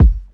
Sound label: boom kick drum f sharp key